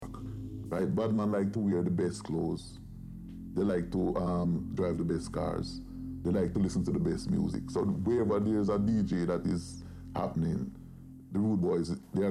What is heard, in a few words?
Deep Dark Dubstep